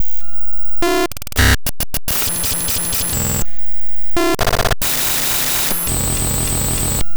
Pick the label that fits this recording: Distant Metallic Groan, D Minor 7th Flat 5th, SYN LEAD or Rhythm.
Rhythm